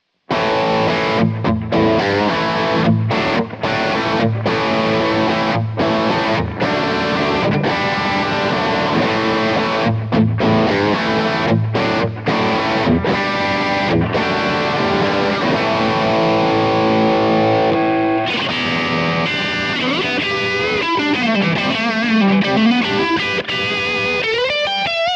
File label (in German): PlexiRanger Plexi On Mittelstellung; Carl Martin